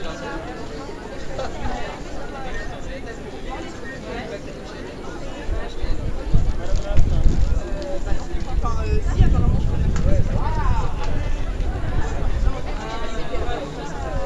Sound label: cafe